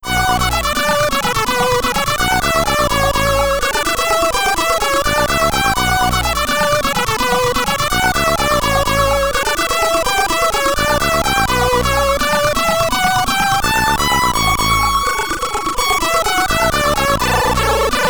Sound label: In-Game BGM (SID Stereo); Ark Pandora; Commodore 64